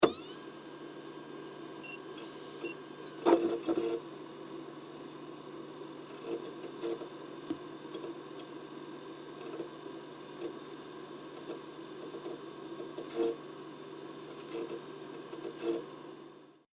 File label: Dvd Blu Ray Machine Long Data Read; Entertainment System Sound Effects; Andrew Fleming